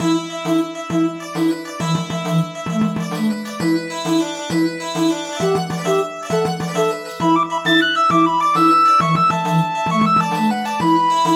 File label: Flutenist Dance